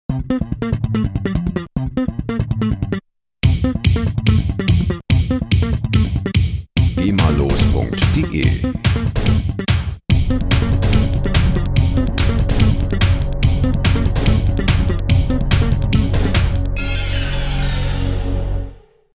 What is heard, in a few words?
king slap